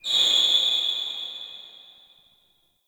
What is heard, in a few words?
Distant Shoe Squeak C; Warframe; Ambience; NineteenNinetyNine